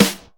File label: Prominent Acoustic Snare G Key, acoustic snare tuned to the G note